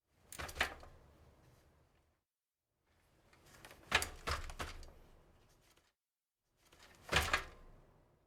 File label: bicycle trick land